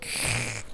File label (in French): Lion discret